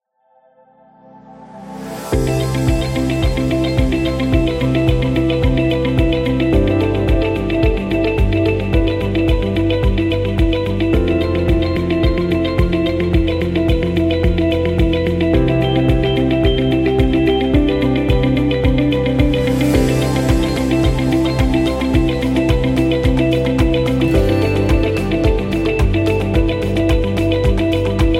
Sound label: Medicine Background; corporate music vol 19